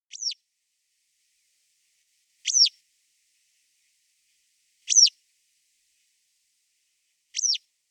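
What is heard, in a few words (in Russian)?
malaja zheltogolovaja trjasoguzka, citrine wagtail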